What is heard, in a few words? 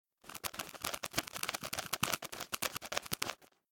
rat